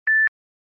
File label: Beep once